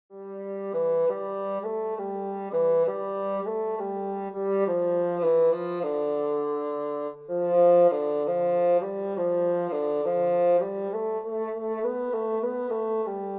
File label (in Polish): nagrania instrumentów; szkola na tak